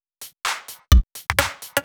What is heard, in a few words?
Electro Loop 128BPM; Vengeance Samples